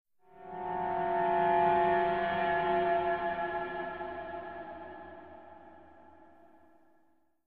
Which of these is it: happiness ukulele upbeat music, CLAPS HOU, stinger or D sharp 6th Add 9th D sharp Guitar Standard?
stinger